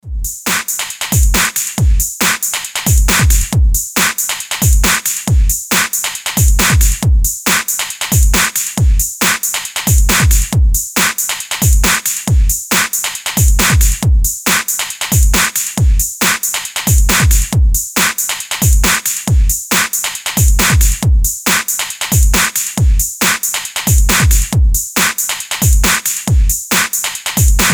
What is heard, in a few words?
137 Bpm